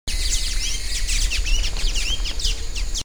Thraupis sayaca

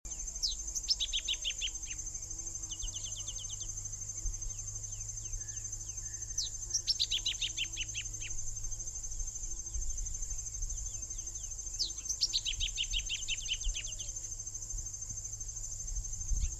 Geothlypis aequinoctialis

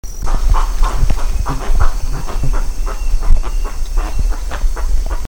Penelope superciliaris alarm

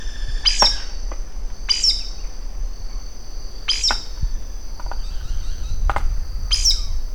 Pteroglossus castanotis

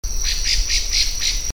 Turdus leucomelas harsh

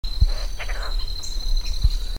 Piaya cayana chickeroo

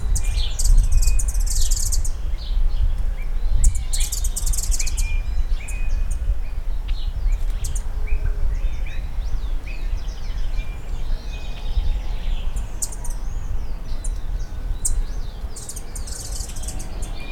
Thlypopsis sordida playback; ayolas

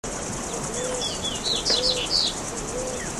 Sporophila caerulescens

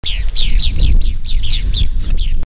Conopias trivirgata

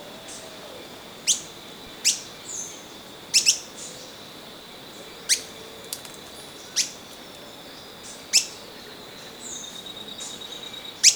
Platyrinchus mystaceus contact

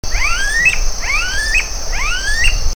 Crotophaga ani

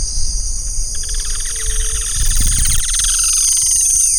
Certhiaxis cinnamomea song

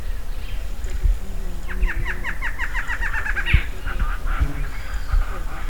Thamnophilus doliatus

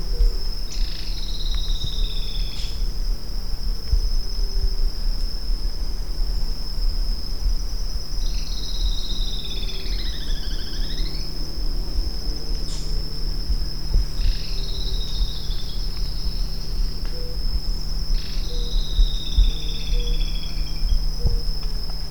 Philydor atricapillus

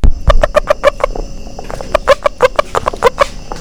Rhinella schneideri; san raf

